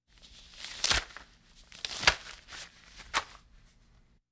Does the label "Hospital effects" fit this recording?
Yes